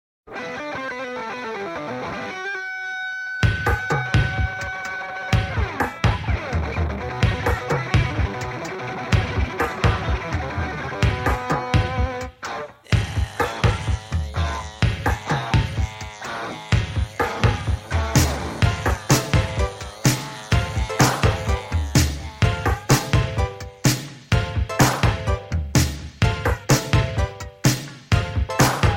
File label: Ringtone